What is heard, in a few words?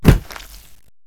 zombie beat; easyrpg; WitchsHeart